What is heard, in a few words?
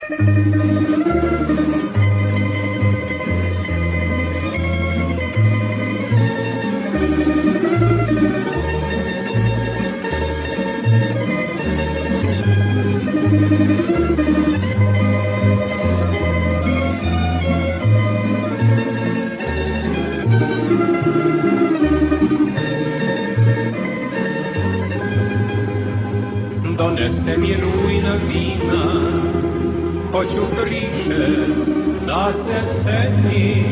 tambura; starogradske